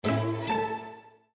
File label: Windows Logon Sound